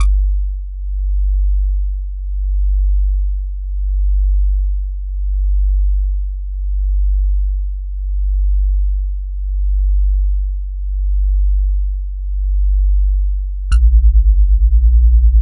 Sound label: bassy bass slow